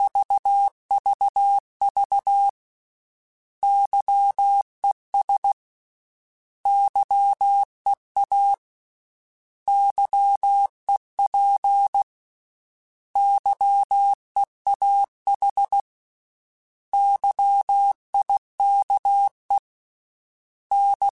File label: Morse Code, CW Code Groups; Mixed; HamRadio